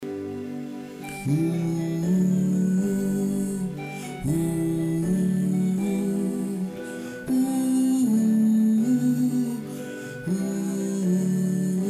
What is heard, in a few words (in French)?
SOPRANES; Pablo Neruda